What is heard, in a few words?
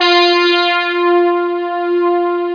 Synth Brass F4; Star Trek JR Audio